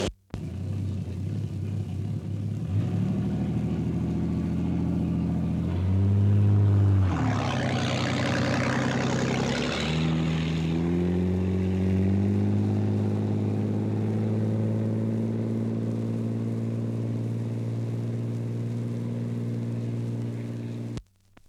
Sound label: Motor Boat Medium Speed